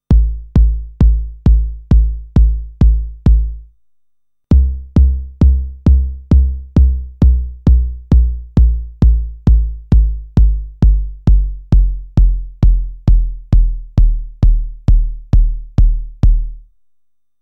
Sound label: bassdrum pitch, TR-909; modifications